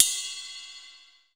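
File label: cymbal cup, drums; East West; Global Sounds; RY30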